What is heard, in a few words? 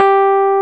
PIA, MKS-20; Sound & Vision; Gigapack I CD 2